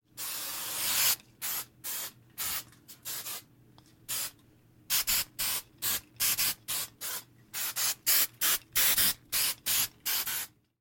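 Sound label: hair spray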